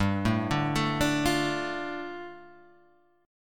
G 7th Suspended 2nd; arpeggiated; JGuitar

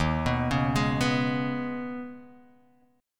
D sharp 7th Suspended 4th Sharp 5th

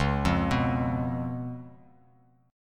C sharp 7th C sharp, Bass Standard; arpeggiated; C#7; JGuitar

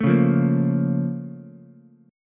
A sharp Minor Major 7th, down, Ukulele; A#mM7 strummed; JGuitar

